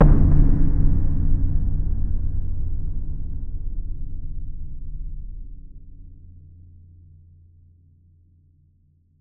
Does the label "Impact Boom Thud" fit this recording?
Yes